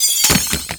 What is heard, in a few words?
Neutralize Alert; Warframe; Mods; Pet Mods